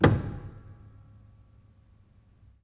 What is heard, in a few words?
Hamm Perc, Piano Hammer Perc; Piano Miscellaneous